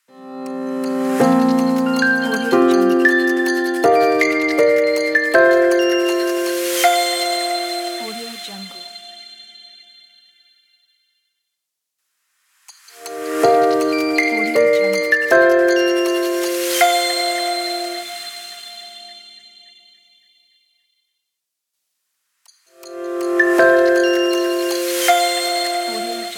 short light abstract opener logo

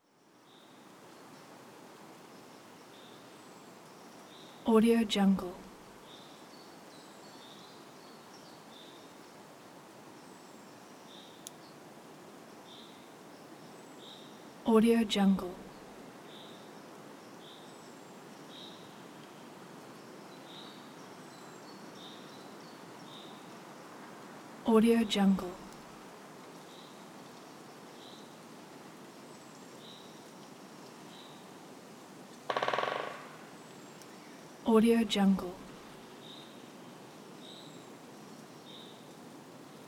windy early spring forest ambience

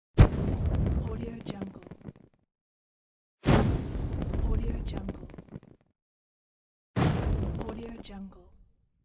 torch short ignition

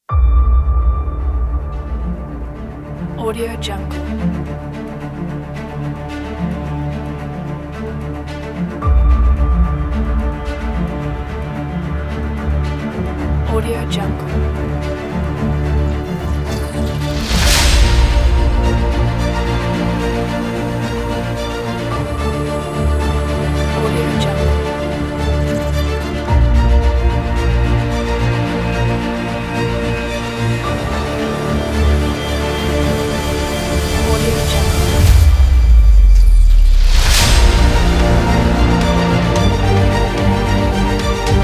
cinematic trailer; soundbay

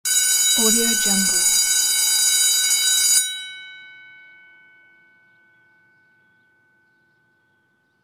school bell

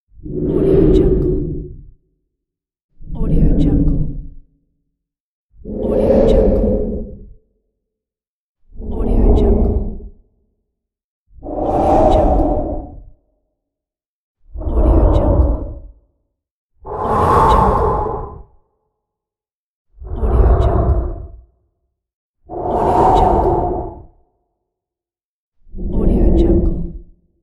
low wind swooshes

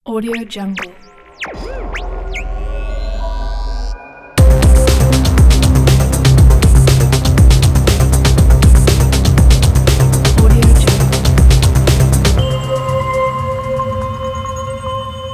intro logo music pack

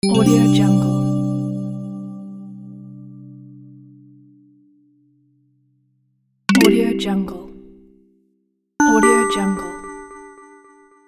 notifications pack

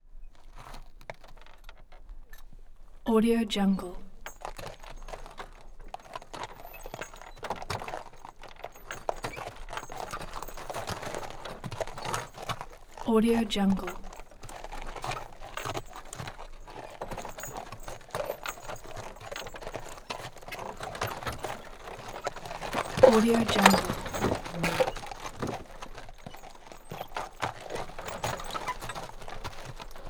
stagecoach

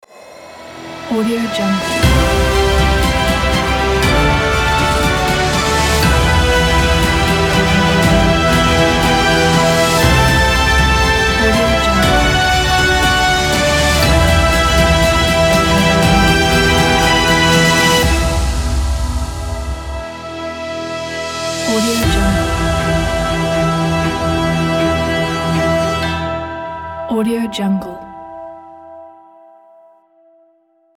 epic awards logo; airfortex

